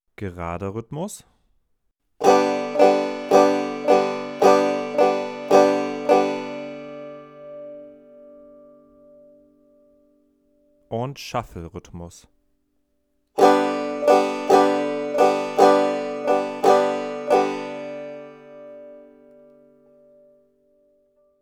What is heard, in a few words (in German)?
shuffle rhythmus